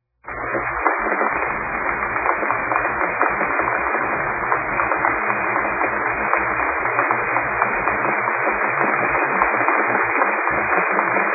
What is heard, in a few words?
rain tin roof